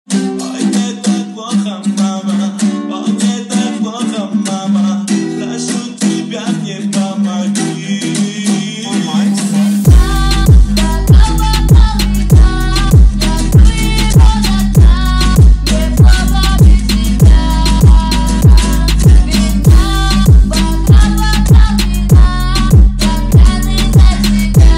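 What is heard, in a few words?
Guitar Remix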